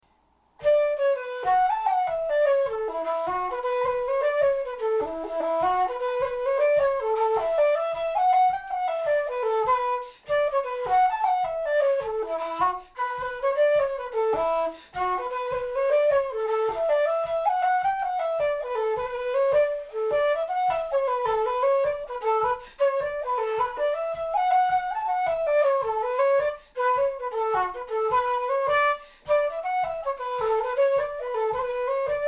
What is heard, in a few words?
SOLO flute; Bunch of Basil